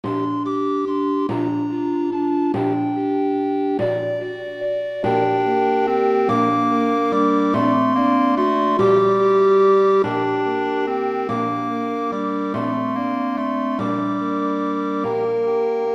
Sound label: Clarinet Track with Melody; We 3 Kings